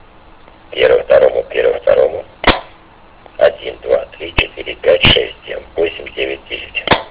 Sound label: sound compand